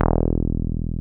303 BASIC E2; Drums In Your FACE!

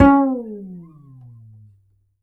Upright Acoustic Bass, 1ST POSITION; Larry Seyer

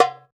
Tabla Tak; Roland BK-5; Percussion

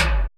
METAL MASS; CYBER 1

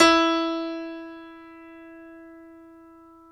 PNO, Grand Pno, Roland; Club-50 - Foundations Roland; YPno 1 S

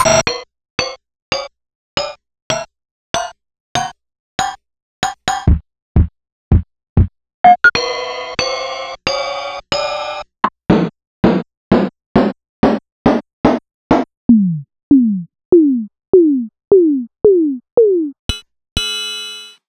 Realistic Concertmate-670 One Shot Kit

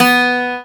C GTR HARD B2, Celtic Flavours; USB Soundscan vol.42; AKAI

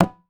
IYA ALT, BATA; Voice Crystal - Steve Reid Definitive Percussion

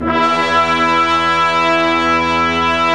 MONO BRASS; HigherChoir Orch

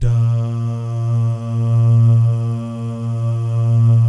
DAH, Vocal Jazz; ILIO

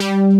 FUNKY LD BASS; Pro-Rec - Synthsonic; BASSES

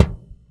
Punch, Kicks; Airwindows

